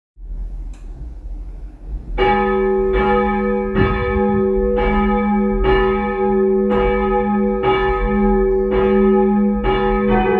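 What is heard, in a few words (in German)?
Glocken St. Jürgen; kg stmarien lilienthal; 2020 Corona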